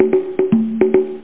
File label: congas; The Cruncher 1.1; Cruncher Candy